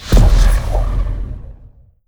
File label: stellarites fire beam; Stellaris; guardians